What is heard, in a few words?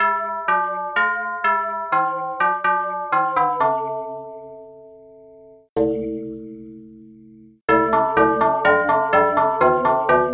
tubular bells